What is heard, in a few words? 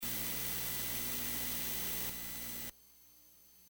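noise be gone 2 sids; c64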